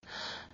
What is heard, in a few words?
Breath; Lewd SFX